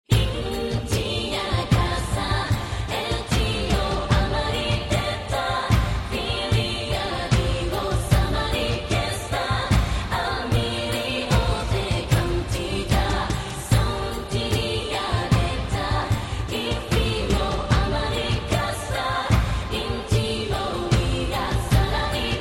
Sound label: bgm